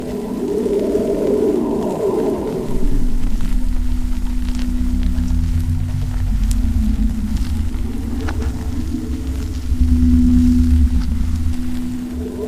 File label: buildings destroyed loop; LoL